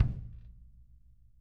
KICK R

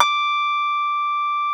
D5, SYNTH PLUCK; Music Store - Claudius Diemeter Schwerlos

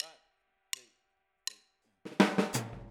Boogaloo - Hi-Hat, 82 BPM; Drums; Intro; Sonic Foundry; Drums from the Big Room The Mixes

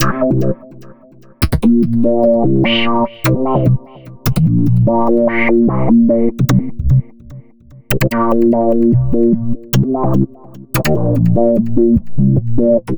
Synth Bass

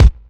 Kick, Kickdrum; Equipped Music - Loungin House

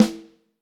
Snare Drum; Q Up Arts; Johnny.C's Vintage Snare Drum; 7.5X14

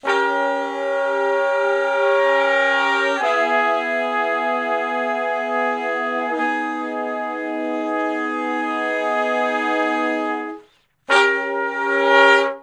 Slo Minor Blus Chords (V,IV,I,V) (Ab); Sonic Foundry (Sony Creative Software); Sections

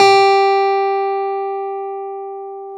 Clav CA G5, Clav; Keyboards of The 60's and 70's

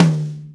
MIDTOM, Drumscores, KITS SMALL; 90s sample CD

